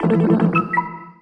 SPC SIGNL, Anime Sound FX; AKAI; FX SIGNALS

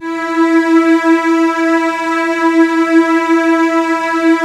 E3, Strings; ILIO; Kirk Hunter Virtuoso Strings VOL-3; STR TAME

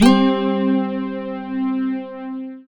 SYN, Roland JD 800; Sound & Vision - Gigapack I CD 2; JD 800/990

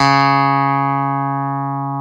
Clavi C#4; Best Service Gigapack 1; PIA CLAV

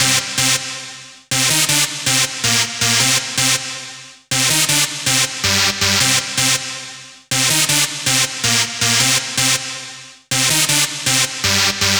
Dance Synths, Hardcore Loops, Synth Loops

